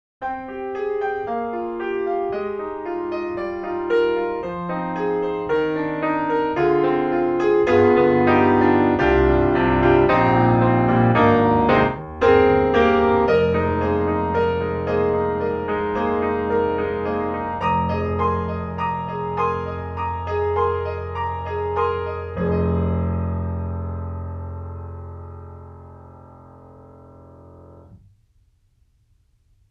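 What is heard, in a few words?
piano; Soniccouture; The Hammersmith Professional Edition; Rack Focus 3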